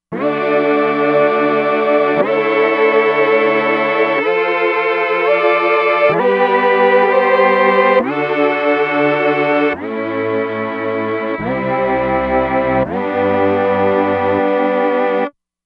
Mellow Tron, Roland Fantom XR

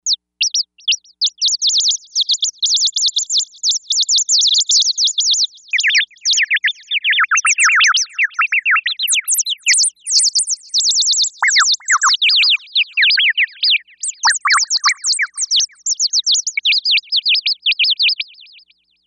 Churp Churp, Novation UltraNova; Factory Patches

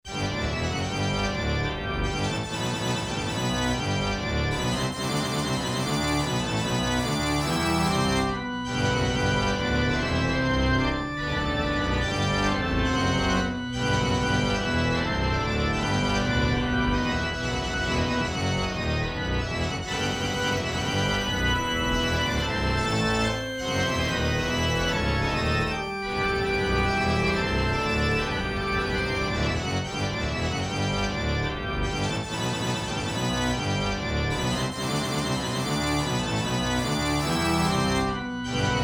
Pipe Organ song

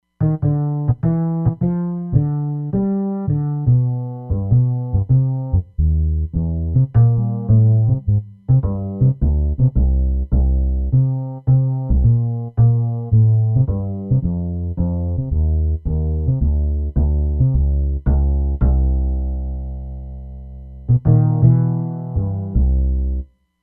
Ulti Ac Bass

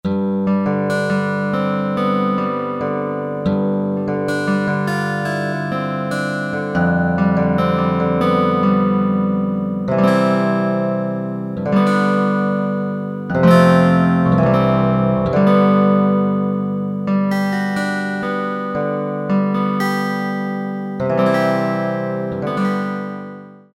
F. GUITAR, Korg DSS-1

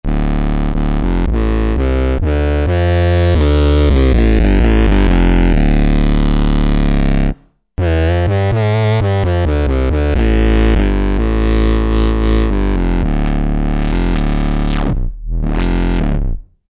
Mod Wheely; Novation XioSynth 25

